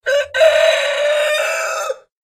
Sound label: Cockcrow